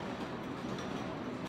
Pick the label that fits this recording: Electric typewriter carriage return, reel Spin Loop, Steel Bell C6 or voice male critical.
reel Spin Loop